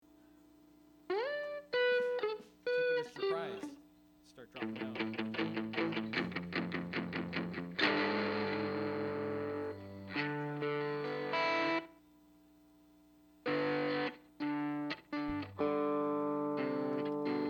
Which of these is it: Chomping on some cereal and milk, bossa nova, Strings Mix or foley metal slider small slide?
Strings Mix